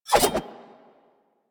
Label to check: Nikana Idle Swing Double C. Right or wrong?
Right